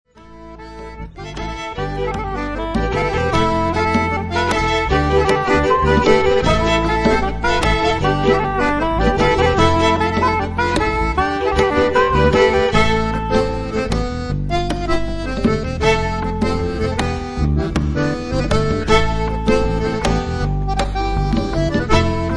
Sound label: Laride trad, balfolk festnoz